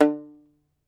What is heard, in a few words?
Tenor Banjo, Plucked Strings